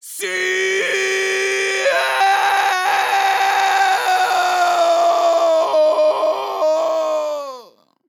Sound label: vocal fills 100BPM A scream